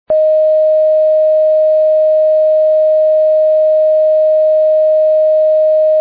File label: d sharp, tuning, recorders